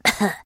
trader cough; 7D2D; 商人杰恩（七日杀）

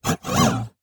negative beep; 堡垒（守望先锋2）; 不行

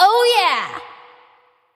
Attack; Seraphine

